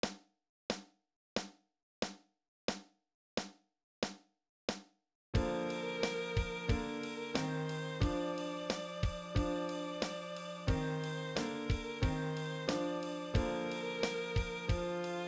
Down by the Station

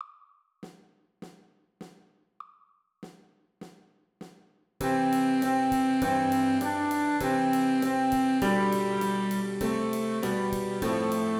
Good King Winds

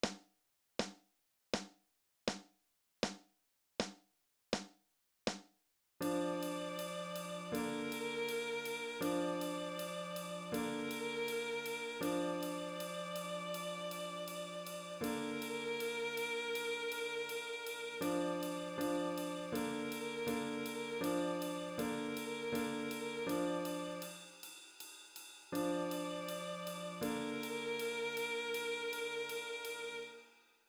christmas chimes

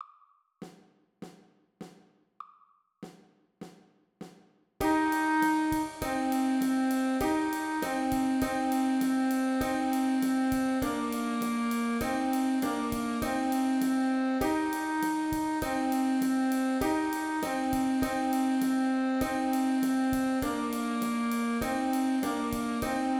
Indian Song Winds

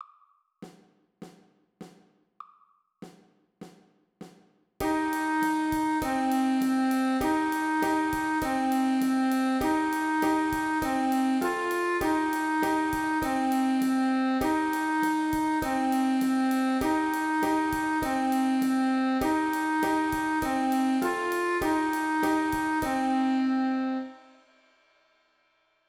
Rain Rain

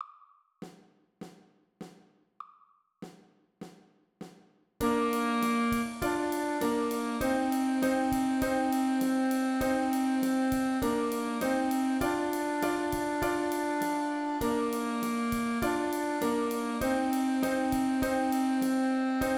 Gotcha Cha Cha Winds

